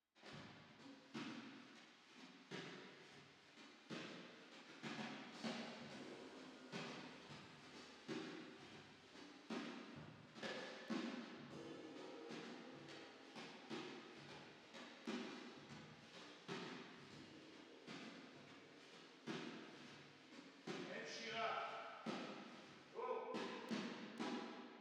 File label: Drums Verb